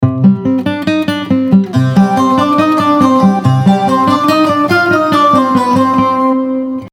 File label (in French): Sonnerie; Tunisie Telecom